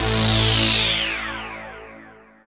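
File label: space bass A2; Drums In Your FACE!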